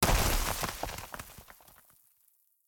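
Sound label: Rock break Stone Hit Shattering Into Fragments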